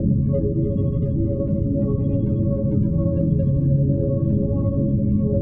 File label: drown; ゆめ2っき